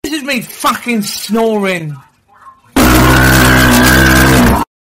snoring

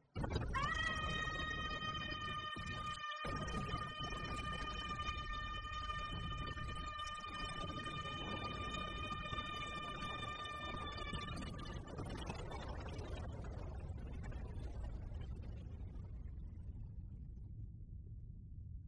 ahh

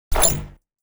robot positive item acquired

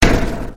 scratch duck shoot gun

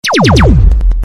turbo laser sound

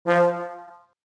a trombone

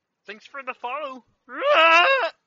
frog; frog follow

